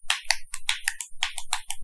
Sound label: crab; nssm